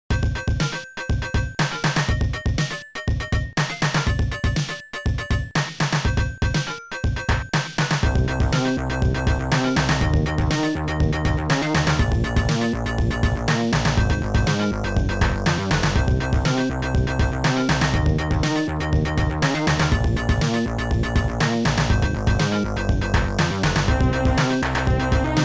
hymn; Turnbull China Bikeride

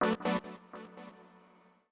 synth house 125 A; eJay; Keys

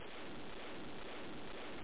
clap

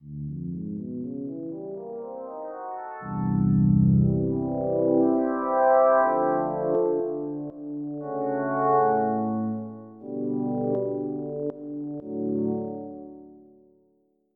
Pad; Onj's Soundfont

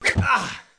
Program Error; Atlantis

